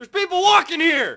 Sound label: yell; Matt Hoffman's Pro BMX 2; XBOX